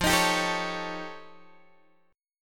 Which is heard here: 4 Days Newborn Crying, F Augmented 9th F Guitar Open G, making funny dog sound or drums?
F Augmented 9th F Guitar Open G